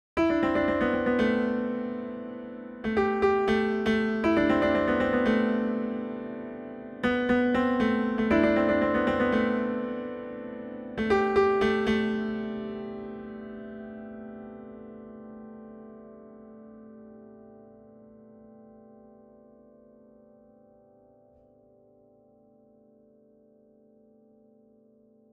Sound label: piano pattern on break